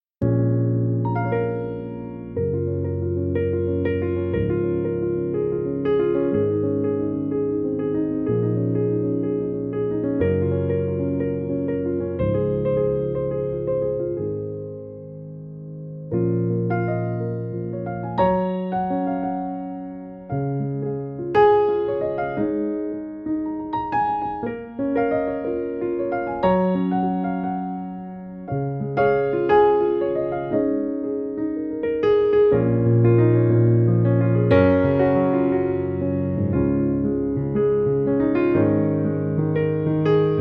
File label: Piano Version